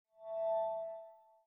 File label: Folder Close; Samsung; Galaxy C7; ui